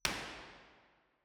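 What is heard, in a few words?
soundfield, impulse response, St Patricks church Patrington; b-format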